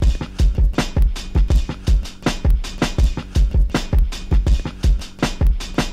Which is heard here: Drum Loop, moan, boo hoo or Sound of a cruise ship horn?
Drum Loop